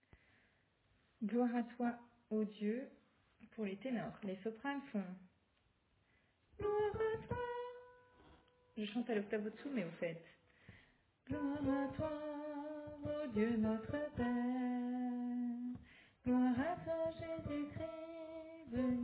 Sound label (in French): Tenor